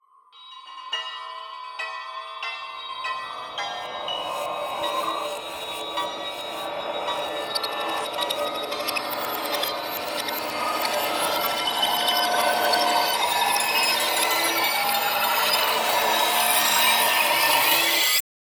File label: Atmosphere Surreal Craziness; MatiasMacSD - SURREAL